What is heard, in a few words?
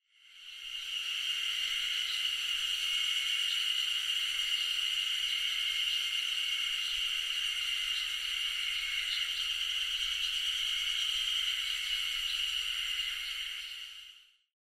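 spring cicada; haruzemi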